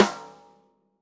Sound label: snare snares on; JazzFunkKit